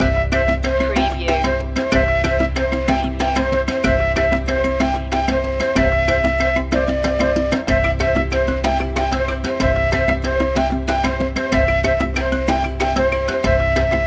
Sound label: african spirit loop